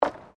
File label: FOOTSTEPS WOMENS LOW HEELS BOARDWALK STEP